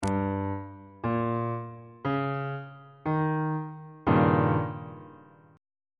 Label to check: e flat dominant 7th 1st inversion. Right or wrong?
Right